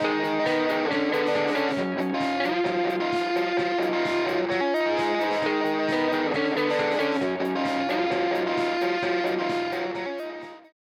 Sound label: Separation Solo Guitars; Recording Mistakes